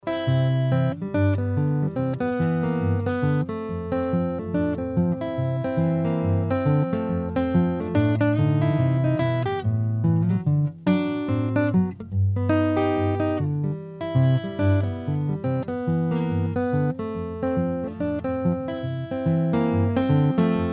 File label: guitar